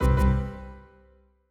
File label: Battery Critical; Longhorn XP Sound Scheme by David Mounder; Reincarnation of Windows XP Sounds in fake Longhorn (Samsung Theme for WinXP) style by David Mounder